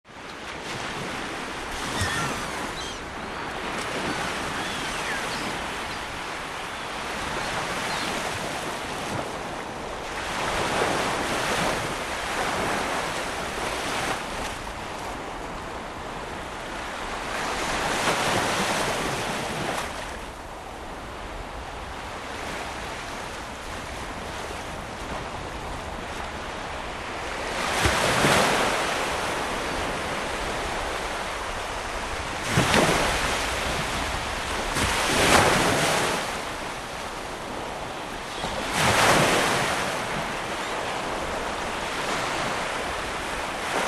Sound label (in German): meeresrauschen